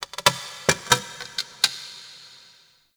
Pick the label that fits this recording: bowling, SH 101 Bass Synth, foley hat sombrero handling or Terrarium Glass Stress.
Terrarium Glass Stress